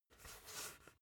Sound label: basketball spin turn in hands short; sport freizeit